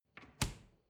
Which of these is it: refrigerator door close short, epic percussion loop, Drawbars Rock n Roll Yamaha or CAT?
refrigerator door close short